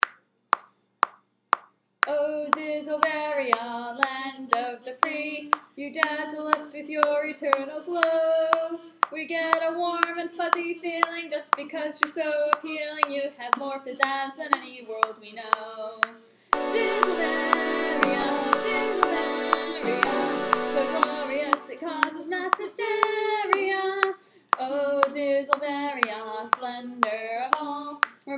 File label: Anthem